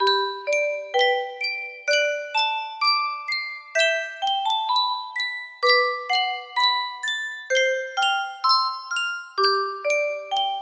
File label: sankyo music box, canon in d